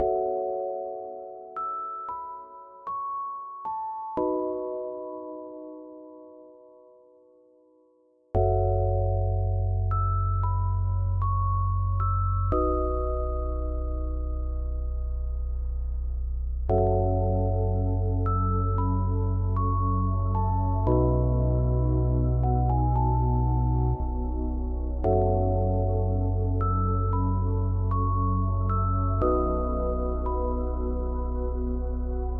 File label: BGM Classroom Old; the letter a horror visual novel switch gamerip 2021